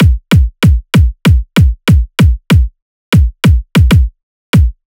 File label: TEK KICK LOOP